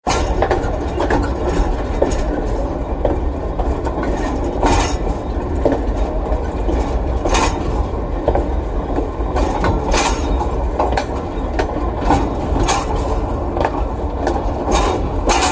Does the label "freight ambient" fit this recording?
Yes